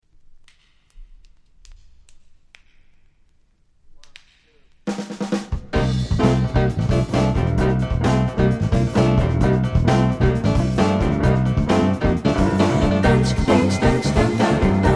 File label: quintet